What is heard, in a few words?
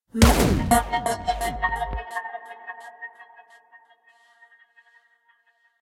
Fight Low Lowlife; Remember Me